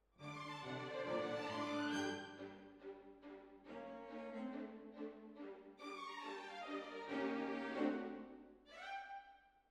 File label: Hall, Jazz for piano